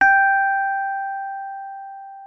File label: MK1F G5, RHODES; RHODES A; Xsample VOL-1